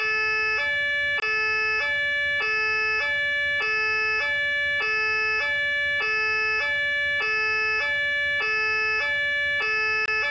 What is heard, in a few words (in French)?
tonalite sirene police francaise; tonalite 8; Rampe de phare led